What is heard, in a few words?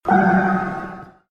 High Pitch